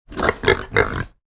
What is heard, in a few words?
pig grunt tap; ea tsto